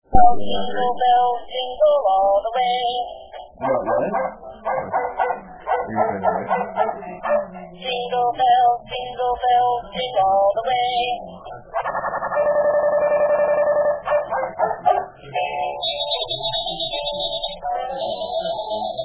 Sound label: jingle